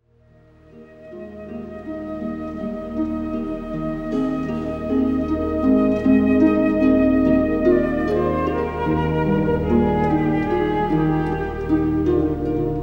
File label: Sonate G Minor